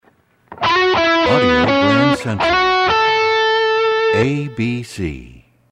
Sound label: Desert Wind, radio jingles